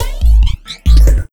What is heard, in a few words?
Bumpers; 808FLG Fx; Sonic Foundry (Sony Creative Software); Esoterik Beatz